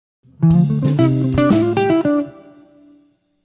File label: Melodic Line